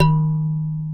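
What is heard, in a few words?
D#2 kalimba right; WorldSounds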